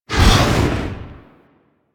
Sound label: Flame Thrower Stop; Warframe; Weapons